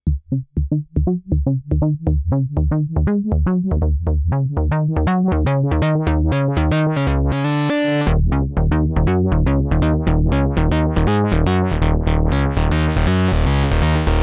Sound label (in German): Synth Kompressor stark; Elysia skulpter 500